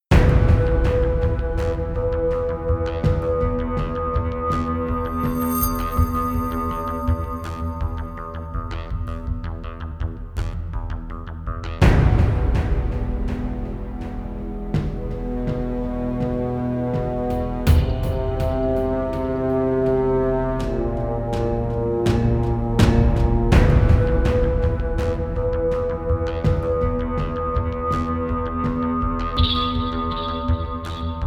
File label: Suspense